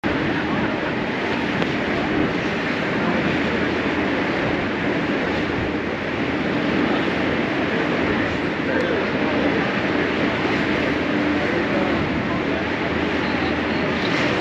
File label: Breaking sound barrier; شکستن دیوار صوتی